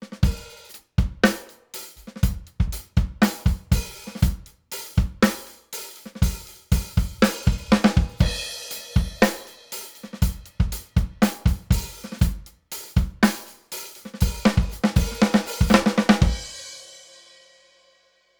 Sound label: Drums Saturation; Rockruepel Comp.Two V2